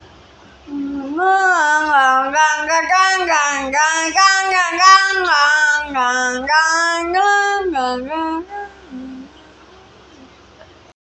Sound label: startup sound; netbar 95 plus